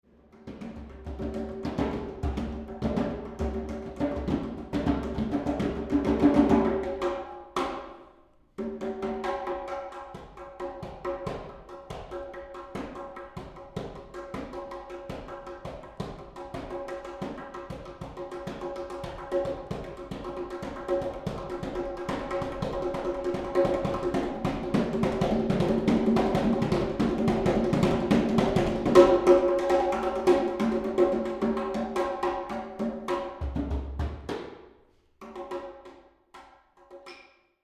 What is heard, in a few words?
Music For Percussion Ensemble